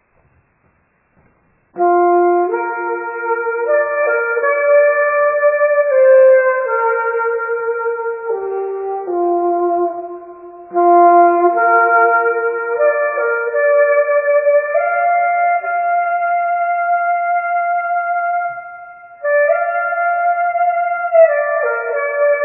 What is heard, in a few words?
Saxophone; ringtone; Antique; Amazing Grace